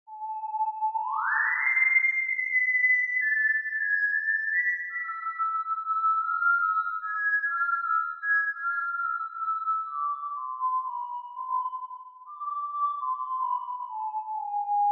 Pure Data graphics tablet Theremin synth test

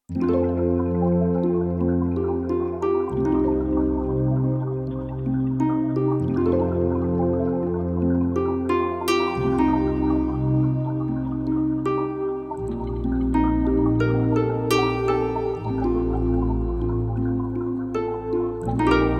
Cave harp, XV-88

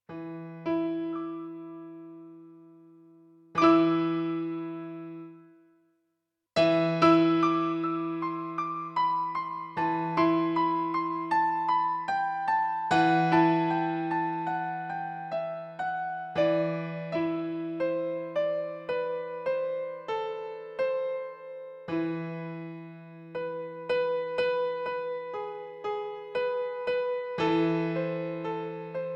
Piano - noodling; XV-88

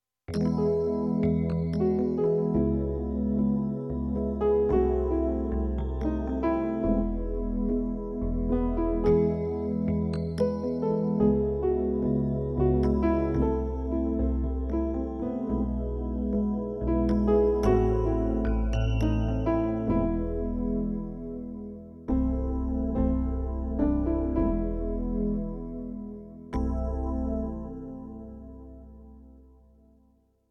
Rhodes ballad with melody, XV-88

